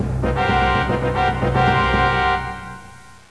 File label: fanfare